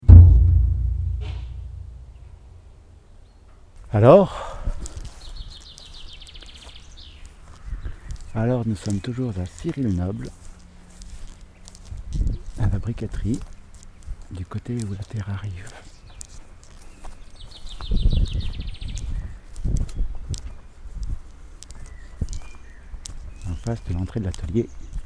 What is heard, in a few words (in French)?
approche